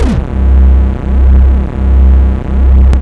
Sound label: Bass C1, club techno; Zero-G